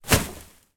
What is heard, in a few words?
foley plastic bag full clothes drop onto other and box